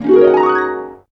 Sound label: f#1, Harp, MIRAGE; Retro Sampler